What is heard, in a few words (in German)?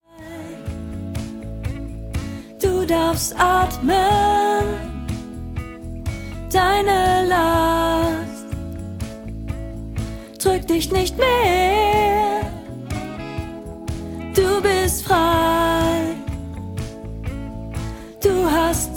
Lern Sopran1